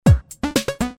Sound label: jack open, Sony Ericsson; Z530i